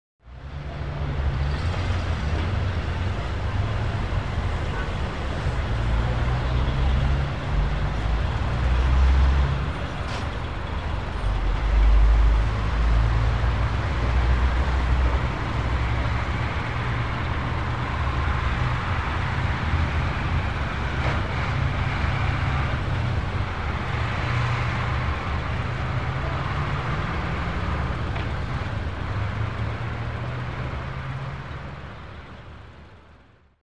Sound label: Large Truck By Close